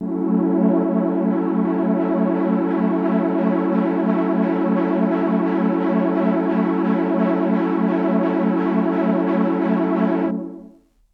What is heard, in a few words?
MicroFreak Minor Float Vinyl Synths Gbm7 F#1; vinyl chords